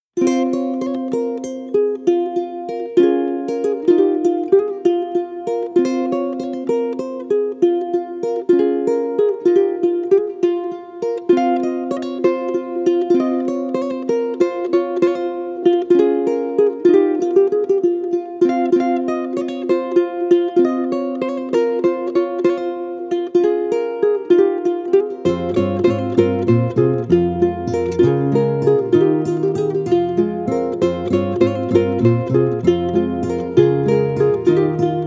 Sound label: Lullaby